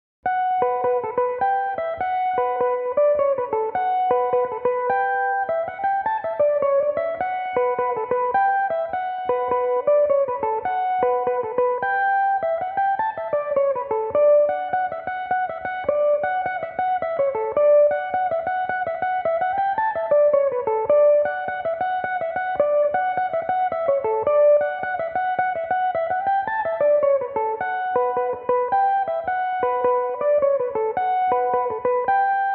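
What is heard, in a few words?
B minor, Irish mandolin; Throw The Beetle At Her; Belmuse EM-190 electric mandolin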